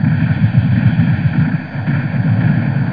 battle; FLY

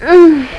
Moan

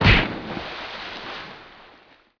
punch; BESTSHOT; noisybit

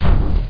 bam; THE REALM

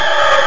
tyres; WRECKAGE

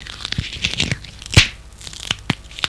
fire; Little Red Riding Hood

